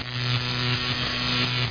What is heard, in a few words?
BOLT; lands